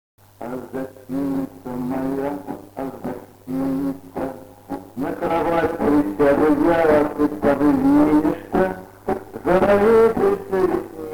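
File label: guitar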